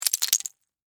Crash Ice Debris, Crash, Ice, Debris, Drop, On, Ground; Debris (Sound Effects:Materials); SoundEffects-BlastWave